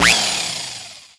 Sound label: Drill